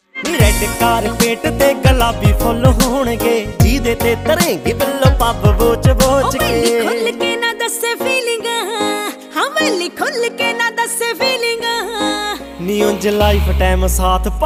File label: Jatt Punjabi Ringtone